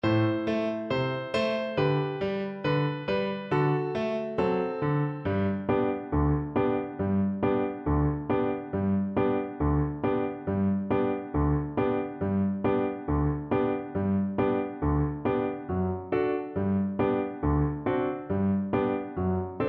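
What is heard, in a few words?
VLC karaoke, Cello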